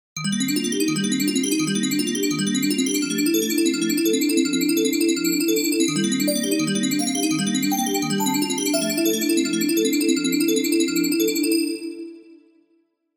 BELL

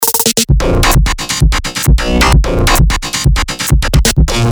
some club

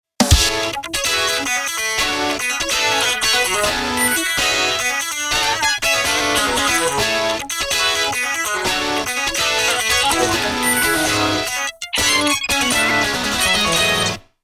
gtr isolate

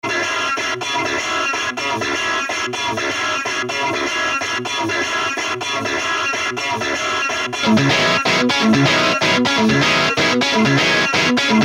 gtr jammed

